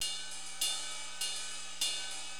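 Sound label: ride loop; Drums; LiveAct Pool 1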